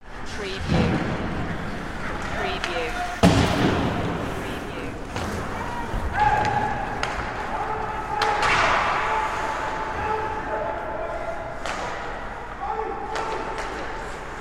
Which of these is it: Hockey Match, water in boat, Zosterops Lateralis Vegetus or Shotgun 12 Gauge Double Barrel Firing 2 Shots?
Hockey Match